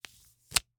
Slap bracelet, smack, slap, whip, felt, plastic, unravel, release; Eiravaein Works; Vaeyan IV